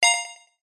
multimedia game tone sci fi futuristic beep generic